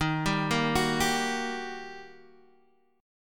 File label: G Minor Major 7th Sharp 5th, Eb